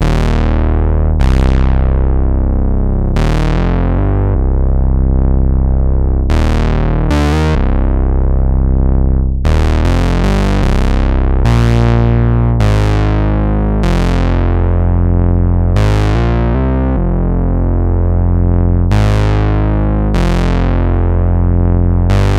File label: Synth loop 76 Eb, Synth Bass Loops; Slate Digital BASSHOLE Sample Pack